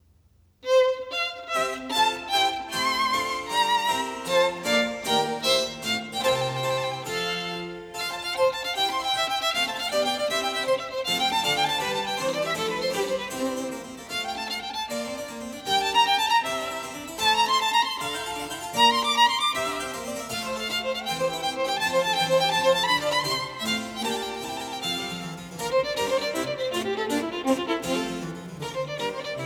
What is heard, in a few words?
Violin Sonata in F Major, Allegro; Corelli; Arr Donington; Op. 5 No. 4; The Menuhin Century; Yehudi Menuhin